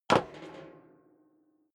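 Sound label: step sneaker metal rattle stair up, footsteps; schritte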